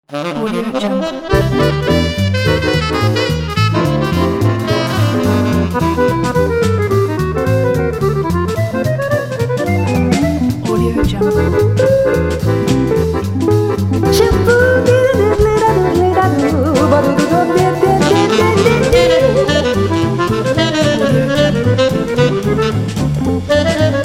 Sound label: jazz sax swing vocal; dealmusic